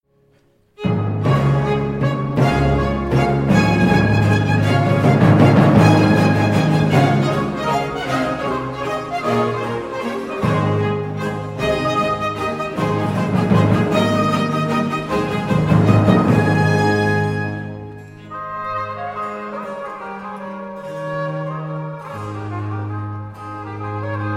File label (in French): Grand Air en Fanfare; Lully Fils; Concert donné au Soupé du Roy